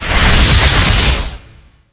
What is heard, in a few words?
energy resist; Earth and Beyond; EA Games